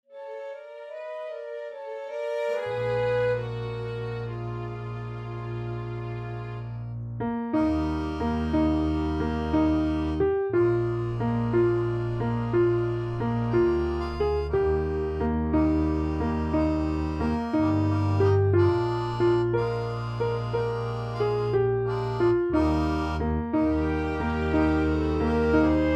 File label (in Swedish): Der Vint melodi